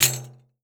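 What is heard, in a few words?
LARGE PANDE, PANDEIRO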